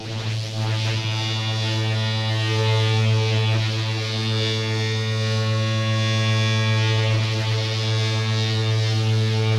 cable destroyed; Half Life Alyx; music; vault

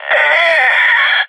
death; fbihrt epic

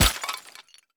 tile impact bullet; physics; surfaces

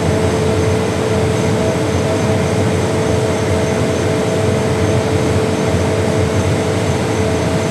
vent; nuke

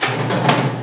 elev, ambient tones

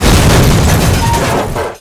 vehicle rollover; Half Life 2

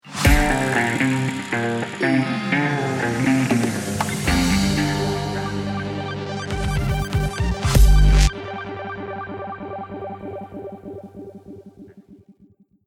lost round, music

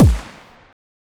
head pistol shot; weapons; Half Life Alyx

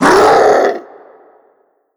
alert, zombie vox; creatures

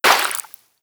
debris water, bullet impact debris

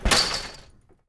footsteps metal chainlink

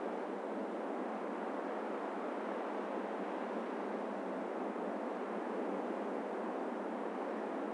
dust wind

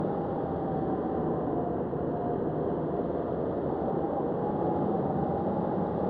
office outside wind; Counter Strike Model Pack; CS:GO

